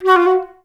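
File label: flutes F#3; Best Service; Peter Siedlaczek's Advanced Orchestra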